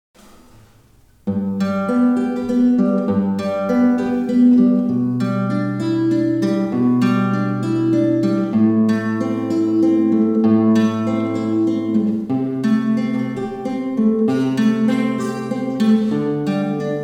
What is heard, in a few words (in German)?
Etuede solo; Czerny